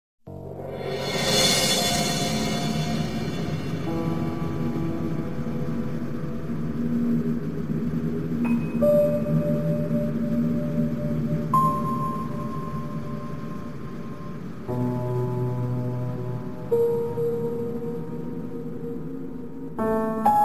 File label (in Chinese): 幽深鬼哭狼号